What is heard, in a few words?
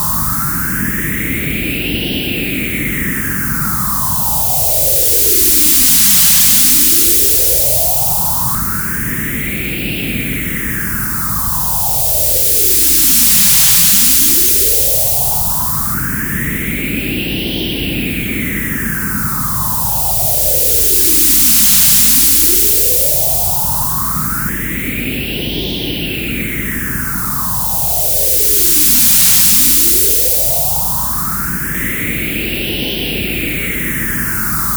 all phasers